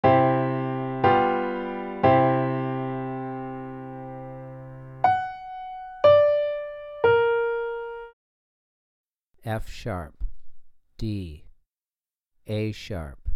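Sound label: 3 Note Melodic Piano Major f#4 d4 a#3; ear training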